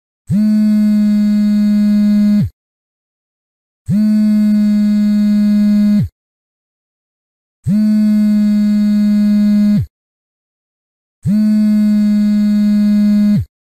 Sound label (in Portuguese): Som de Celular Vibrando; Efeito Sonoro